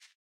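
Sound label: Footstep Grass; boomerang fu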